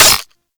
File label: Mech, Magnum; Tenno; Magnus Prime